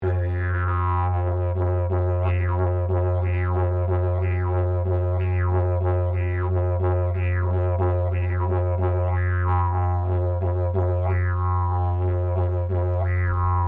Didgeridoo 3 Part Slide Didge Drone Loop, plastic telescopic didgeridoo; Plastic telescopic didgeridoo, modern design; Musical; Miscellaneous Musical; SoundEffects Foundation2